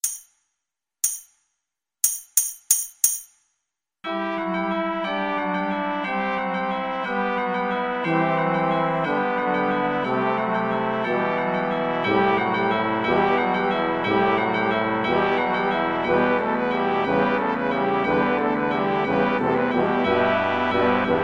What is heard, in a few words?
carol of the bells, Guitar